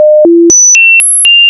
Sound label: tones